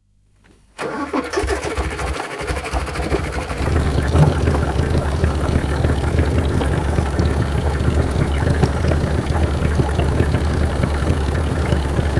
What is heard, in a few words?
small motorboat engine starts idles and stops